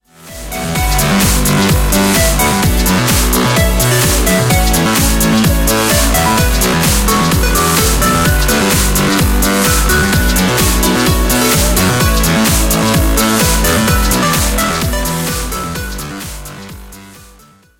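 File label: background music crossfade; Invaxion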